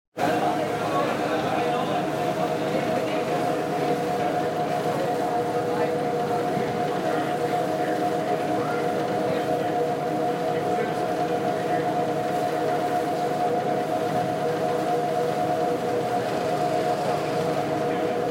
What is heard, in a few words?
eerie singing of the clipper ferry engines; thames